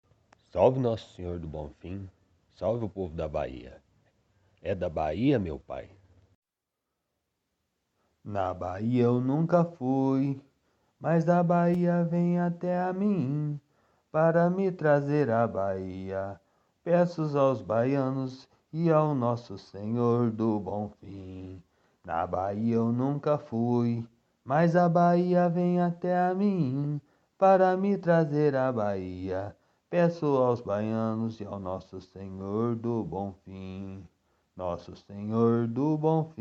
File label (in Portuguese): ponto cantado de baiano umbanda; terreiro ze malandro